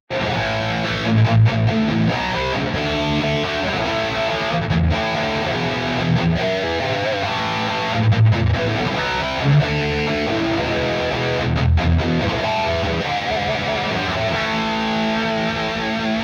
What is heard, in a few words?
Rhythm Amp Doubler On; Archetype Petrucci